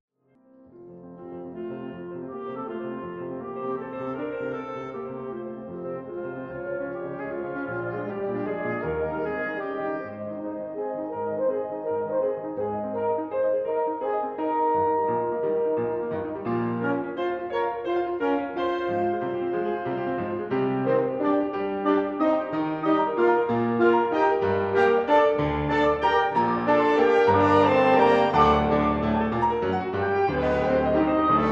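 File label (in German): Bläserquintett; 1.satz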